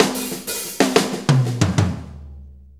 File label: 6-8 Fill, Drum Tools